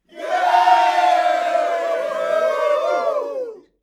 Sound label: cheer happy short